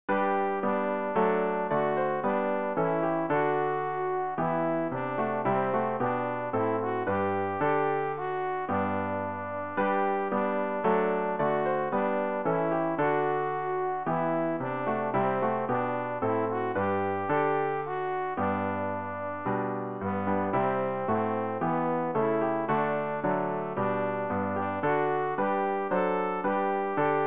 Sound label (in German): alt, Übehilfen für das Erlernen von Liedern; ref gesangbuch; rg 182 kind du bist uns anvertraut